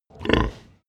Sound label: pig grunt close